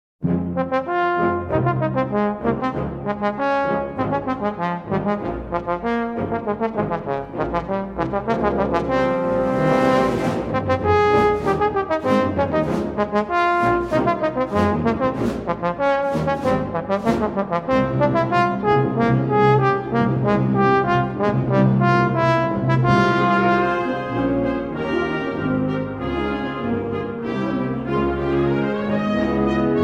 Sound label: Trombone Concerto, Allegro